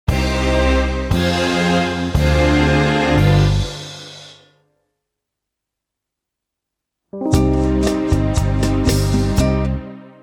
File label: brass minus